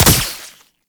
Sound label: Wall Climb Dodge End Point; Warframe; Oraxia